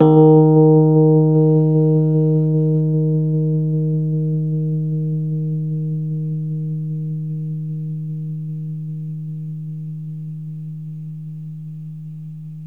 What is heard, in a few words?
Rhodes 73 Slow, D#; E-MU Producer Series Vol. 5 – 3-D Audio Collection; 3DRhodes